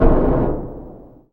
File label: FX Dance